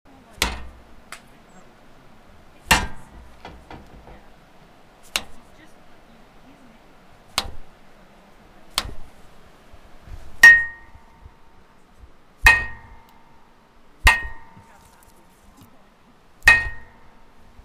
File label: Bear Locker